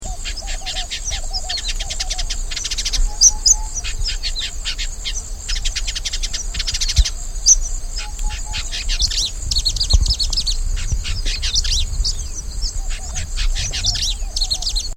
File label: Vidua raricola; Indigobird Song